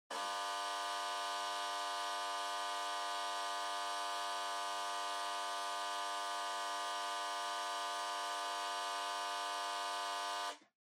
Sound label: Flip Clock Far Buzzer 50Hz